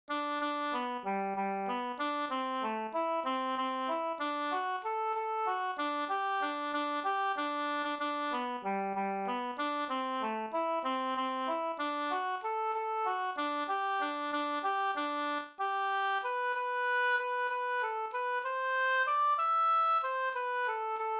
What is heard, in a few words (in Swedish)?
vals